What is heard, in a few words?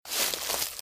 Footsteps - Bush walk - Right; Eden; RadwinIsland